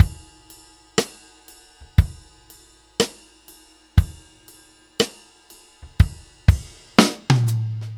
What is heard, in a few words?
Ballad Loop, Drum, 70s Ballad; Drum Tools